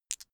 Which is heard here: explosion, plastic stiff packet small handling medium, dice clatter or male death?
dice clatter